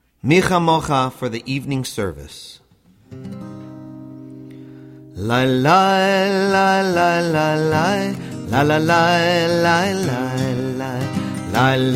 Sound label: Mi Chamocha Slow